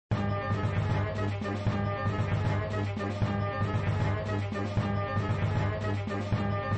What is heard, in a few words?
Music Match Sequence Music looping; flipper